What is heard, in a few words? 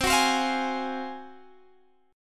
G sharp 13th C